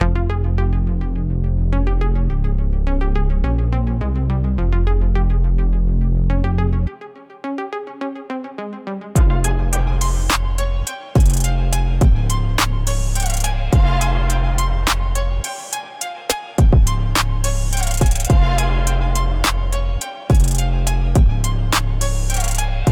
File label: Puls plastic No Vox